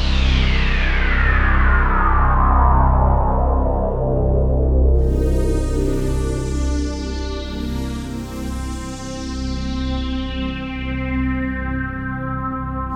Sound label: Dystopian Game Open Looping